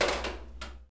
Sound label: drop ball